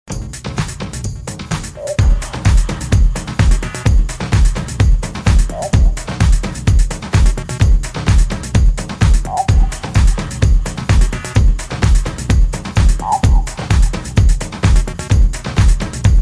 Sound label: remix